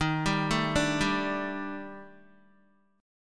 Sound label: Eb Major 7th Flat 5th, Guitar; arpeggiated; 10,10,9,7,7,x